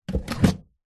foley wooden box case pick up from hard surface; zapsplat

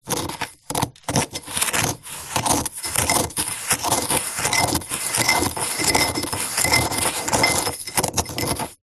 sugar in pot teaspoon stir mix; zapsplat

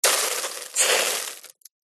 foley metal hot water drips into sizzle; zapsplat

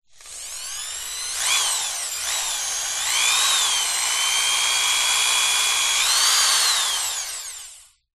Hand Drill

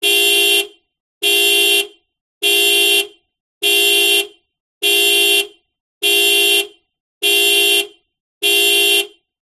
transport car alarm horn beeps

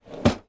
computer desk keyboard tray slide in; office

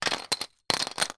leisure board game tiles drop into box; rummikub

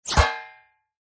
bullet hit metal; zapsplat

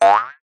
cartoon jump jaw harp

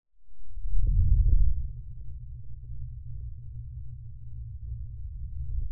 Whoosh Rumble; bottlerocket

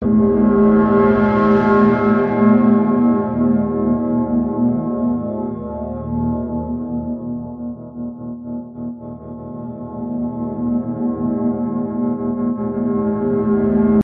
glitched tones abstract texture

